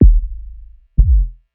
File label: two kicks